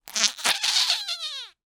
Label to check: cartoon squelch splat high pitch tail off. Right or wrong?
Right